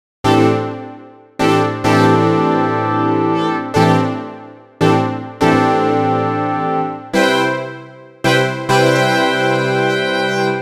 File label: GX-80 Brass; Cherry Audio; GTG